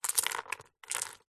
foley gemstones several small opal amethyst jade short movement handling on surface